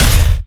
attack impact; dota; shredder

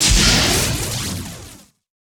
storm bolt; sven

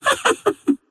laugh; Vengeful Spirit

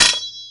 attack impact; dota; pangolier

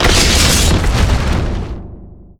impale; weapons; dota; lion

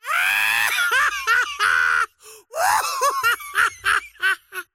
wheel laugh; dota; largo

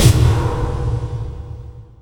blink out; weapons; dota; antimage

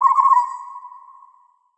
waterfowl; ambient soundscapes; dota

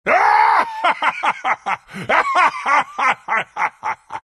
bearform laugh; dota; lone druid

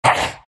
big pain; Undying

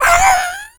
death; creep; greevil

